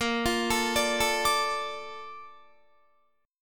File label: Bb Major 7th, Bb, down, Guitar; arpeggiated